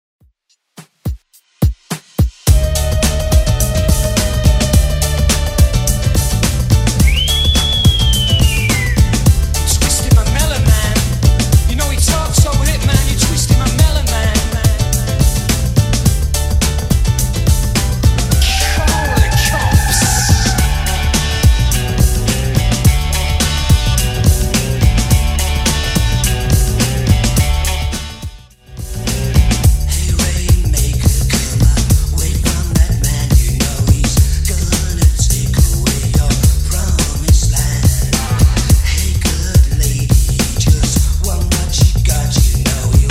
90s Redrum 106 bpm

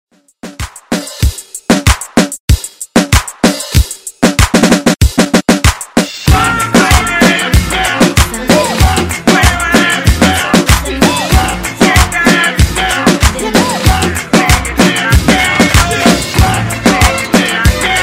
raggaeton latino

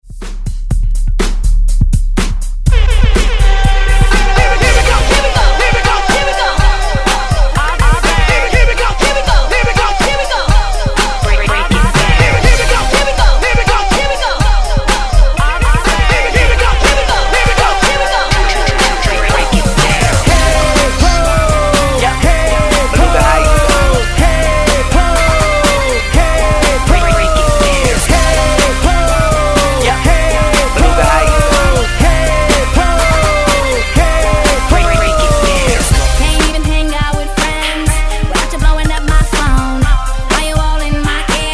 Hype Re Drum 122 Bpm

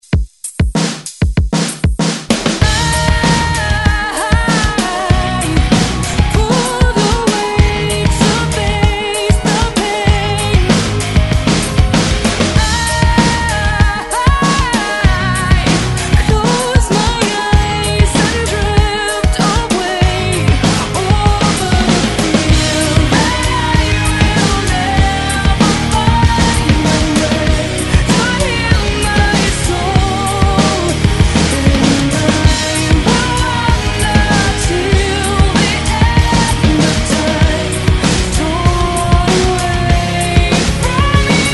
Alternative Rock 96Bpm; DJDOLLS

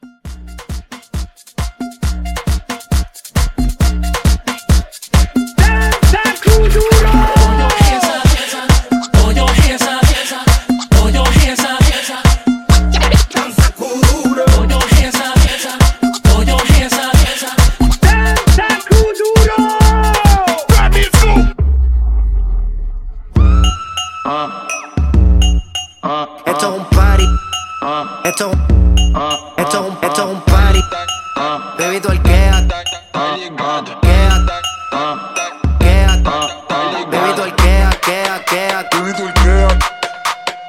Latin Hype, 135; Latin Hype Edit

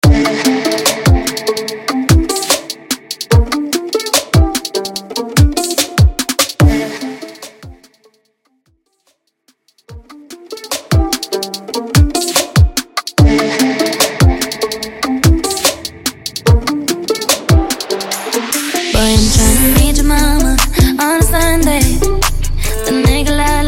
Re Drum 73 Bpm; 2020 Mainstream VIP Mixshow Extended; Vegas Edit

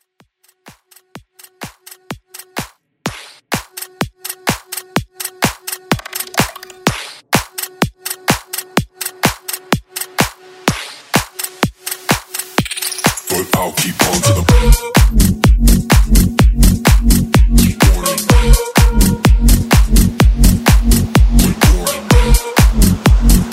Reboot

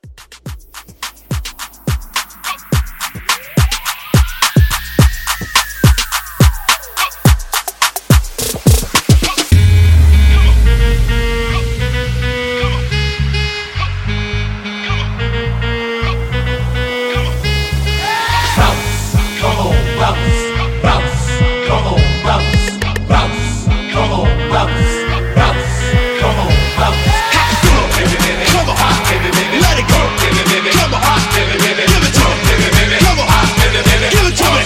Twerk Hybrid Re Drum 106

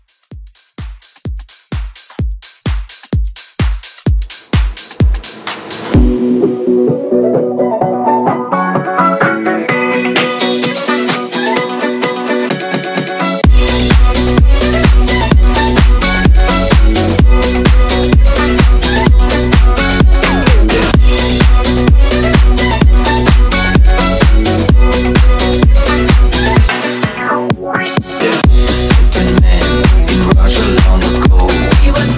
Mixshow Re Drum 128

